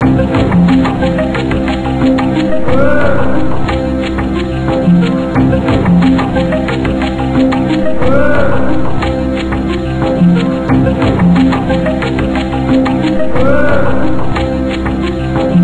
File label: tribal